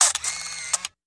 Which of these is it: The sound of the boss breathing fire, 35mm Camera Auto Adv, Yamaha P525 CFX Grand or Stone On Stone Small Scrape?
35mm Camera Auto Adv